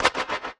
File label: Hat